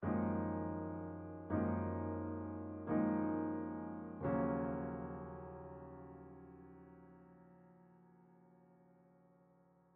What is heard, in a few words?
Museum Boss Piano